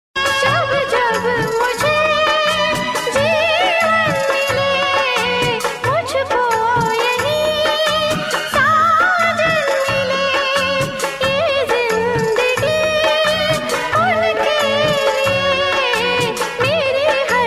Old Ringtone

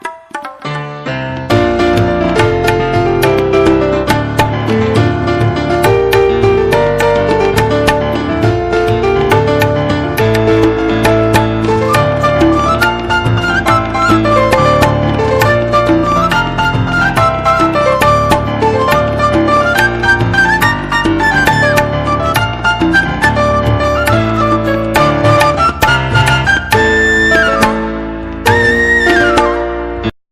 Indian Instrumental Ringtone

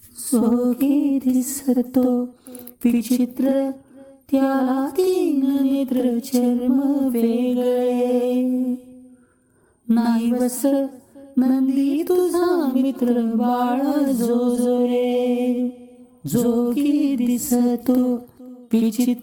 Marathi Ringtone; ringtones

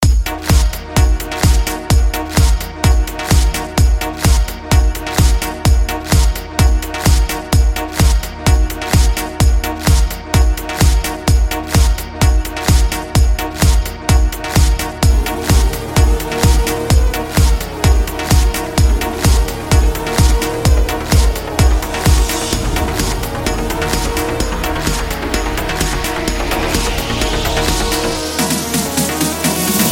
Om Namah Shiva Music

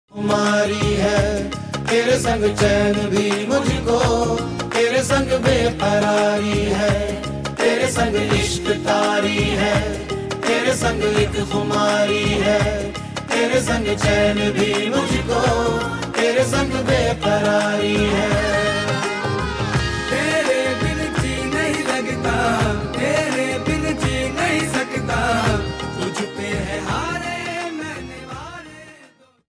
Ringtone, Bollywood

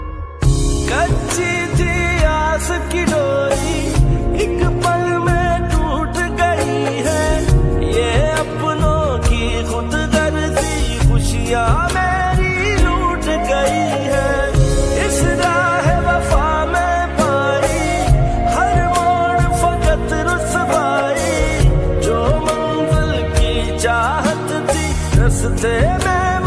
Sad Hindi Song Ringtone

